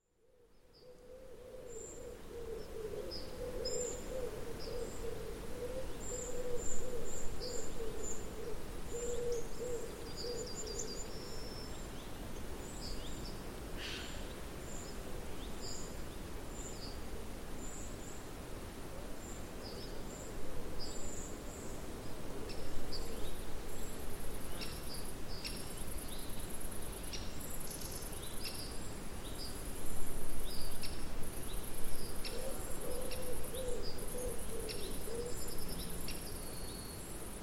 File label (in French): ambiance friche